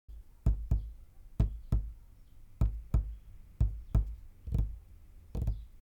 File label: Fingers Tapping